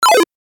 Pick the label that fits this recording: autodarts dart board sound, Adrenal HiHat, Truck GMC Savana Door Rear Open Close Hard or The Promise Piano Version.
autodarts dart board sound